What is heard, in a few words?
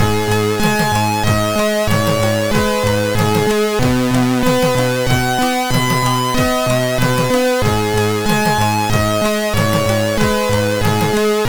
tune, sid, MOS6581R4